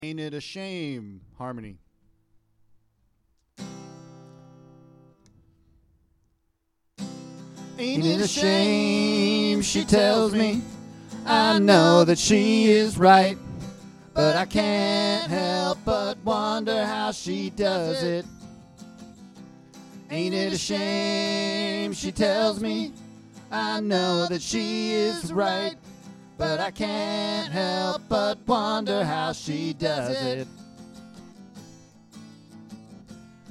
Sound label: harmony